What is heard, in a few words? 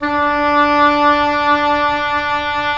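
wind cor anglais; LA Composer vol. 3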